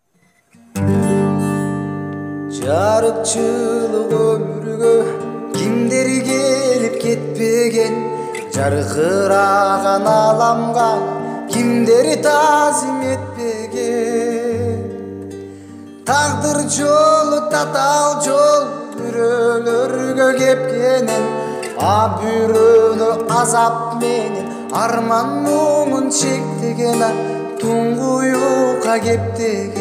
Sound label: gitara